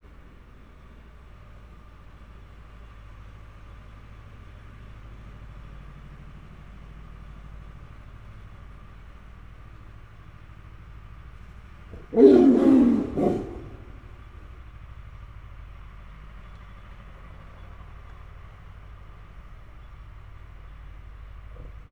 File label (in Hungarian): kifutóban üvöltés traktor, bengáli tigris; miskolci zoo 2009